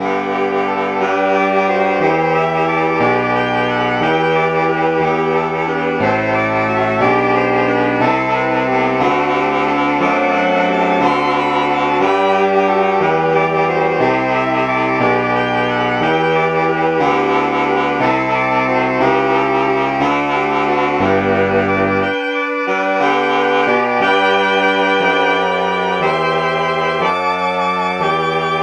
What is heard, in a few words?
alto sax